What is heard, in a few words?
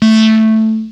Hottbottom A2; Analog Synths; Ableton Foundation FreePacks